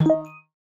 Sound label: notification in app